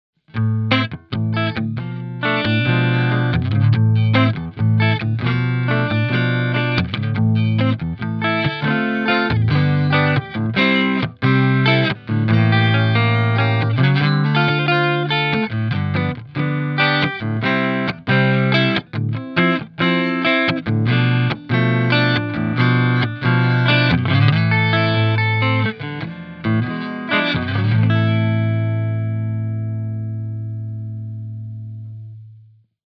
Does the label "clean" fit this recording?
Yes